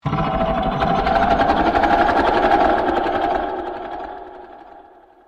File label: beatbox; fnaf